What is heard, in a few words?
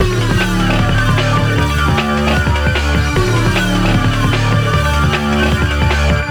Hardcore Breakbeat, 152 Dmin; Big Fish Audio; Hardcore Breakbeat Action; Gone Insane